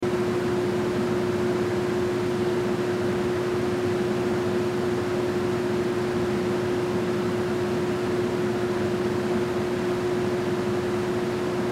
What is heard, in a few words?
Stove Fan Loop High, Stove Fan Loop High Oven; Appliance; Household; Blastwave